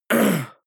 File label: harrumph male mouth open short; koerpergeraeusche; menschen; soundpool